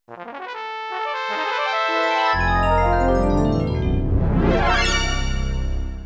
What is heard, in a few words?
Ident; Where Is Carmen Sandiego The Classic Crimestoppers; World CD-ROM (PC); Broderbund